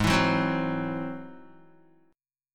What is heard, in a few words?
Ab Augmented 7th Ab down Guitar; Ab+7 strummed; JGuitar